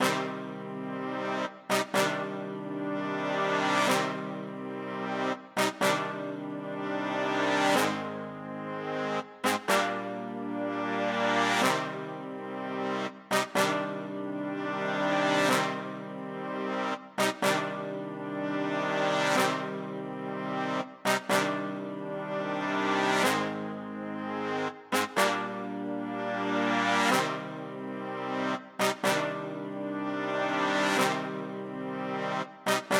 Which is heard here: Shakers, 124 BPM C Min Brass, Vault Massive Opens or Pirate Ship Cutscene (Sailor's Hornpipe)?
124 BPM C Min Brass